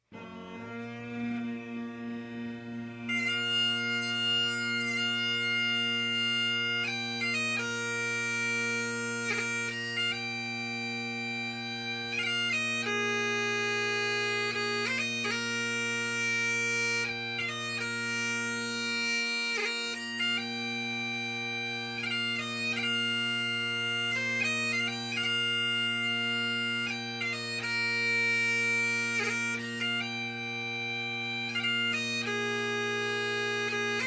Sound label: Desert Storm, bagpipes